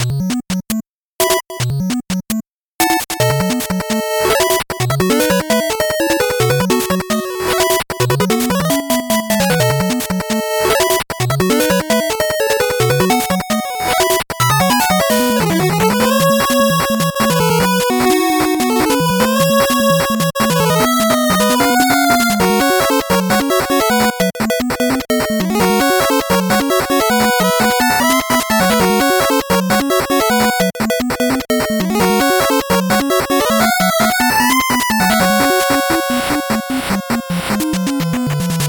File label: 8bit bgm fast